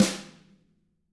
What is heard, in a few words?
TAM 3 HIT